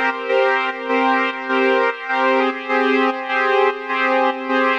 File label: Pad 100 C, sidechained